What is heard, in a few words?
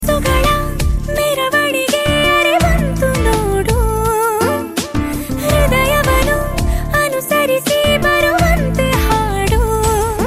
female